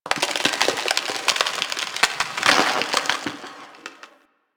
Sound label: Landscape Ice Crater Creak, Ambience; Warframe; Venus Open World; One Offs